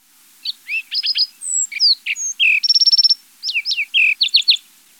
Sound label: Lark Sparrow